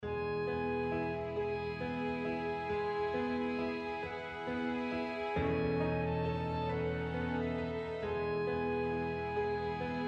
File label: Piano Concerto